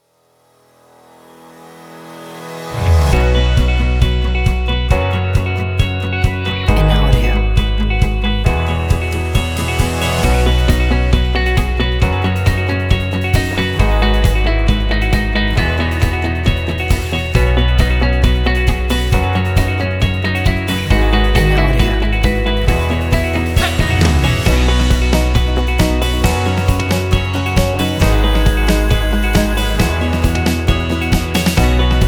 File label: Action Indie Rock Motivational Main Version